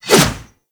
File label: Pole Arm Whoosh